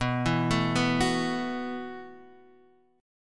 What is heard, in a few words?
B 7th Suspended 4th B down Guitar Standard; B7sus4 arpeggiated; JGuitar